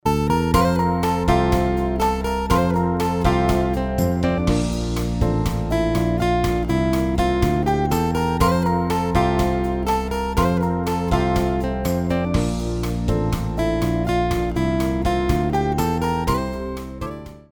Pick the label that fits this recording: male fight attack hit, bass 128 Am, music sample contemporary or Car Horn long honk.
music sample contemporary